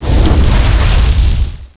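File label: Fire Target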